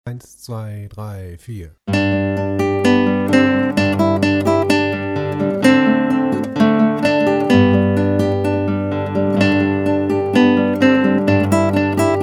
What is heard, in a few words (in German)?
gitarre